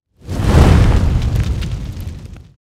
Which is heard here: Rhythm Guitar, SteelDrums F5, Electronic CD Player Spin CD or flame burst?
flame burst